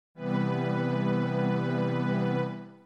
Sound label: B C Major7